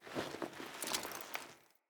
Foley Move Short; Friendly; Deora

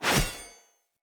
Short Sword Whoosh F; Weapons; Enemy; Swords; SinecureShortSword

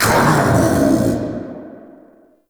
Uriel Brimstone Cast Vocal; Warframe

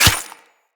Melee Dagger Impact Flesh

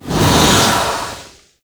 Swarm Tear Spawn; Entrati

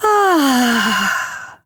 Med Sigh, Mother, Vocalizations; Warframe; Deimos

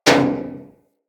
Bullet Impact Debris Pipe; Weapons

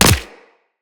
Player Bullet Impact Flesh; Weapons; Bullet Impacts

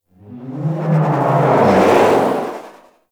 Teralyst Whoosh Step; Enemies; Steps; Warframe; Eidolon

